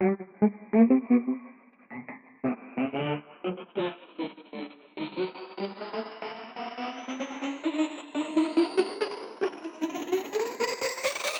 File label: traps in the temple that